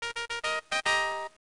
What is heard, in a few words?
Level Up; Daikoukai Jidai II; FM Towns